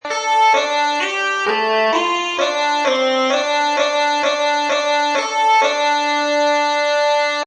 Bagpipes Riff Short D 130, Bagpipe Loop In D Major At 130Bpm; Woodwind Loops